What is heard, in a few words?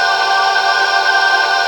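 G4 glass vox; Northstar - The Wizard VOL-1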